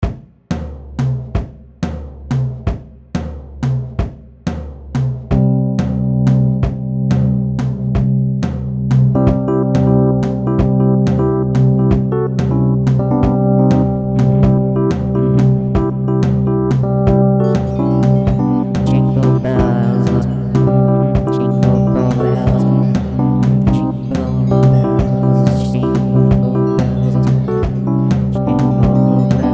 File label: Jingle Bells Interlude